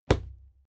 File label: fight impact sweetener punch light; krieg-waffen; nahkampf; soundpool